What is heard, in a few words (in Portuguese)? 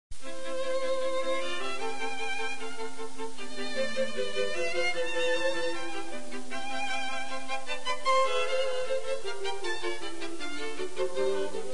frase com acompanhamento